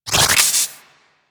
Health Pickup; UI; Pickups; Warframe

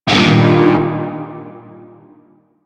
Shawzin Blues Chord; Warframe; Narmer Shawzin

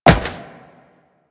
Wall Jump Metal; Footsteps; Warframe; Tenno; Wall Run

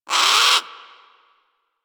Sea Bird Tranq Dart Grunt; Warframe; Hunting Critters; Ostron Sea Bird

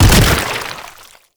Proto Infested Bodyfall Light; Enemies; Warframe

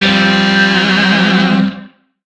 Shawzin Pent Min Chord F; Warframe; Lizzie Shawzin

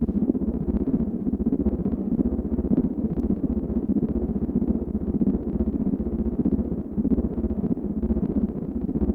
Orbiter Hallway; Warframe; Liset; Hub; Lower Deck

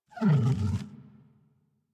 sleep idle end stretch, Kubrow; Enemies; Warframe; Tame

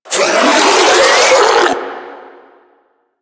Melee Mid Spin Scream; Warframe; Infested; Ancient

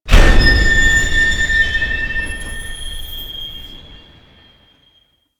Large Train Car Start; Objects

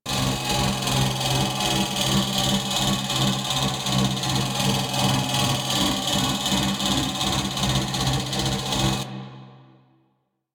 Gas City New Fan Loop; Ambience; Props; Warframe; Corpus Gas City; Props Remaster

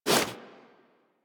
Wall Jump Foley; Footsteps; Warframe; Tenno; Wall Run

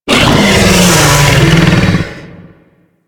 Infested Bone Blade Vocal B; Warframe

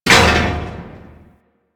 Mech Head Impact; Nechro Mech; Vehicles

